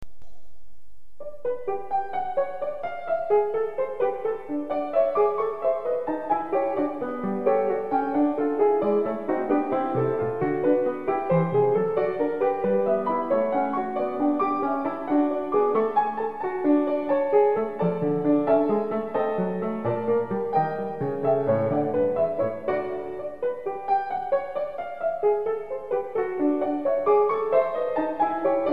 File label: Gigue in G major; Mozart